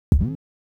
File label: Kick Ring; hydrogen